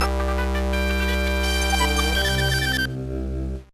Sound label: Unknown Jingle; crazy chase; gba